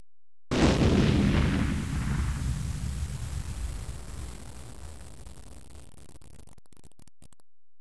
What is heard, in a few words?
EXPL; explosion sounds